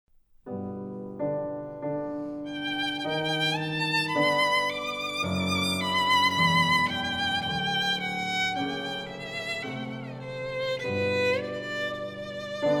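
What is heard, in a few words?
violin sonata; sjogren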